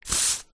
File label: household aerosol insect spray single short burst; zapsplat